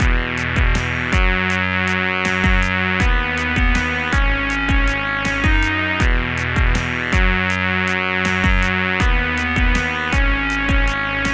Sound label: Backing